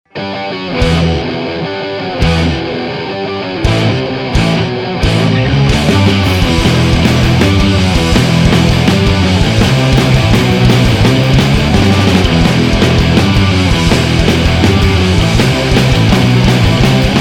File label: breakbeat